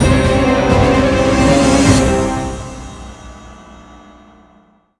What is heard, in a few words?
ui quest failed